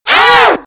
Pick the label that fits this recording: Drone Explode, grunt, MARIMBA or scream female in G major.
scream female in G major